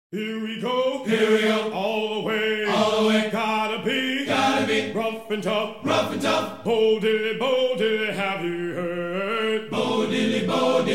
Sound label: Exit Chant; 82nd Airborne